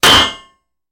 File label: Metal Hits Metal Pipe